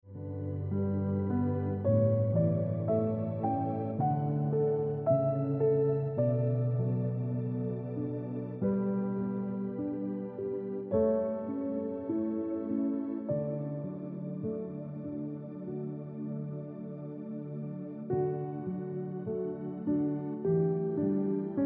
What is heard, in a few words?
Look Back On Ringtone Piano Music